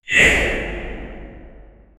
add shadow dark impact, spell

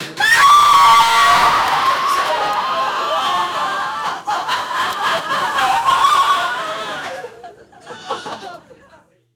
explosive crowd reaction

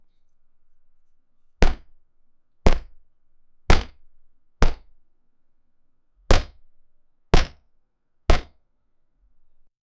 boxing

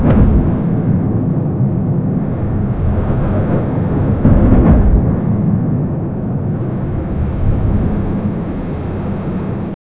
low roar freight train or; hurricane

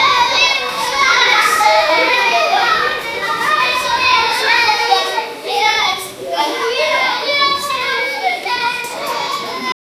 children chanting gentle; school

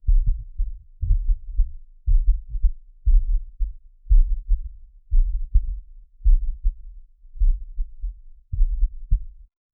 tense hunting moment; tension

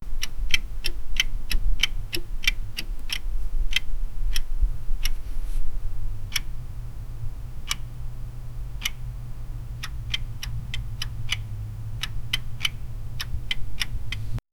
when you launch an ability, ability refresh

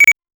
short 8 bit tick, timer

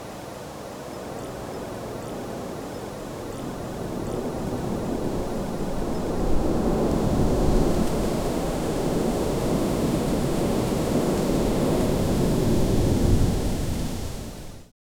forest pre storm strong w; approaching

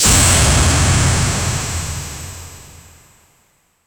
a powerful long lasting, power

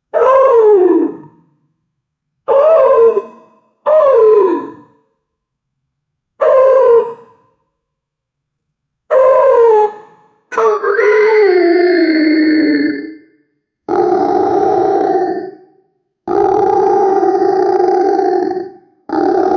create a tiger voice soun; animal vocalization

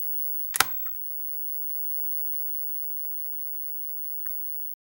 tv zip from turning on; home appliances

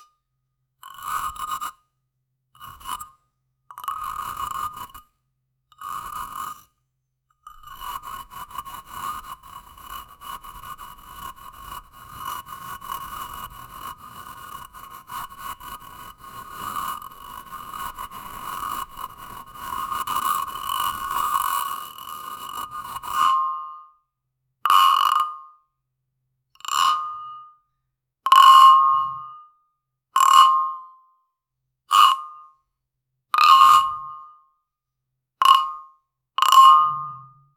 soft wood carving with a; friction

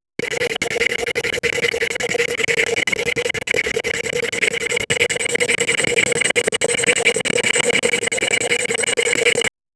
asmr sound effect of a, pencil

